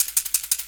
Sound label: Shake 175, Drum&Bass Perc Loops; EZRollers